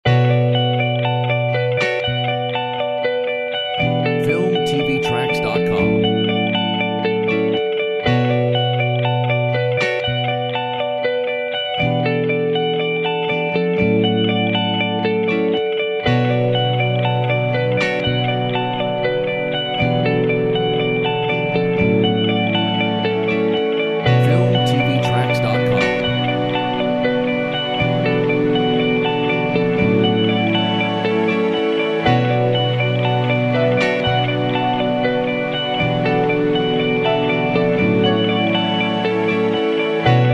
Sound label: Night pulse